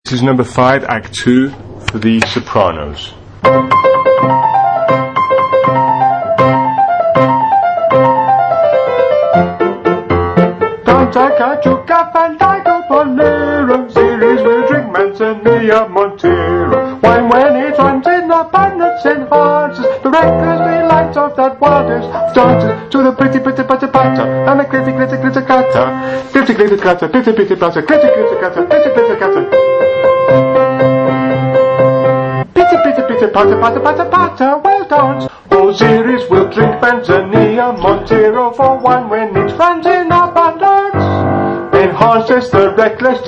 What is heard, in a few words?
soprano; Gondoliers; Act II – No 5